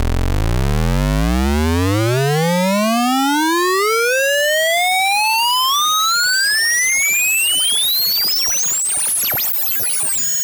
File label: double pulse sweep